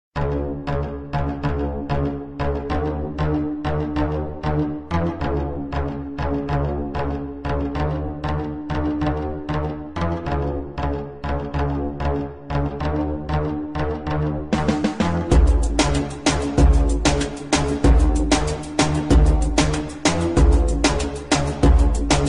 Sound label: Turkish Saz